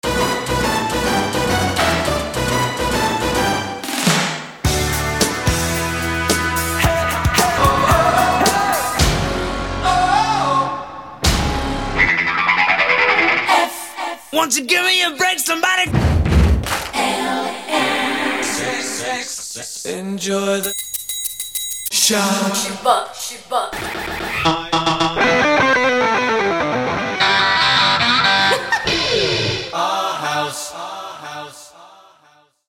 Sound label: retro jingle